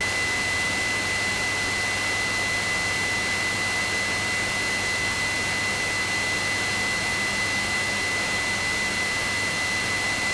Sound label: Radio FM